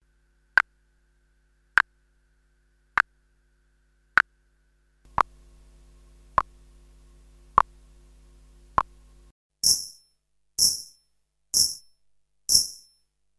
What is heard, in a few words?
Mics perc